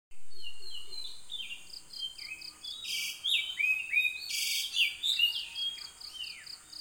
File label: Oriental Magpie Robin